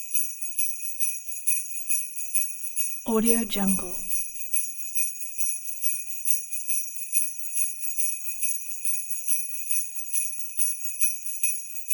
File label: christmas loop sleigh jingle bell medium, 140; esmteam